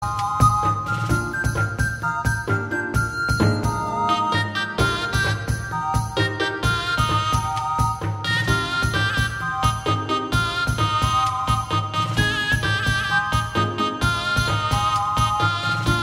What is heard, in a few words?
bardcore